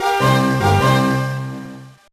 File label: Call Animal; Enchanted Once Upon Andalasia; GBA